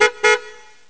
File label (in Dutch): tuut tuut, Kermis Jingles; Kermis Jingles Diversen